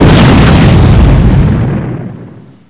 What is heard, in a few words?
lightning